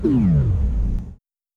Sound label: bullet time on